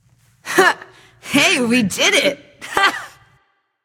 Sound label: yay; smite; mulan